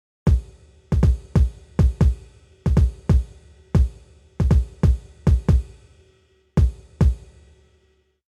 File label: Kick On